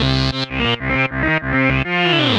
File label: Arp C Line, ARP Odyssey; Lines; synth; musicradar-synth-samples